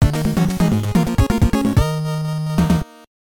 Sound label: Game Over, NES; Power Blade II